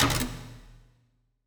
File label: item get; アイテムゲット; musicbox